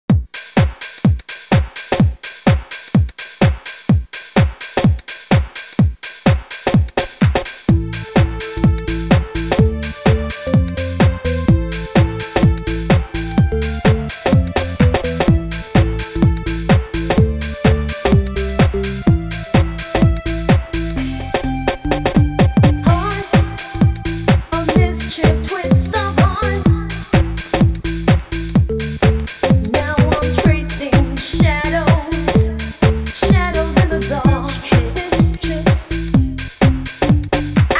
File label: soul train bass; Remixes